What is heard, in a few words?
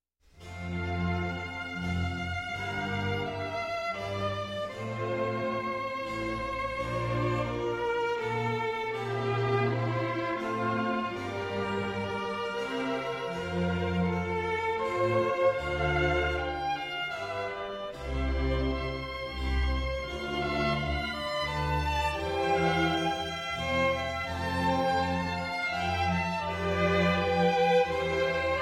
Concerto for Two Violins and Orchestra in D minor - Largo ma non tanto; BWV 1043